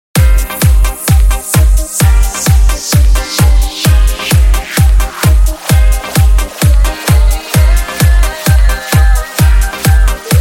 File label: Fast Music Ringtone; ringtones